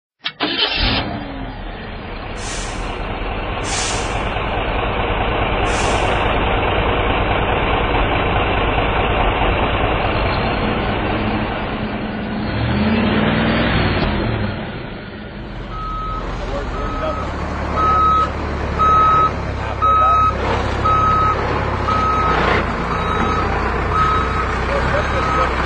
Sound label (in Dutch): Vrachtauto